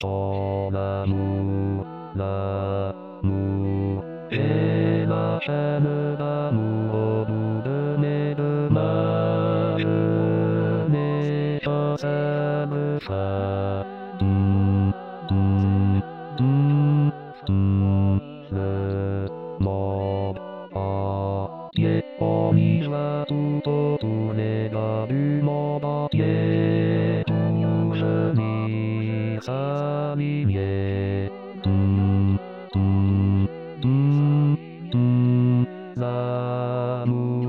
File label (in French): basse